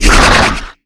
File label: Grunt Shake Head; netent